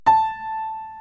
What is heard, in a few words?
piano A